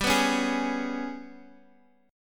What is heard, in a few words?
G sharp Minor Major 7th Double Flat 5th